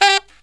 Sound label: SOLOSAX, Killer Brass Riffs; USB Soundscan vol.29 - Killer Brass Riffs; AKAI